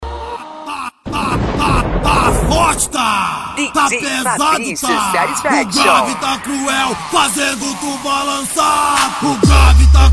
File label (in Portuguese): som automotivo; rebaixados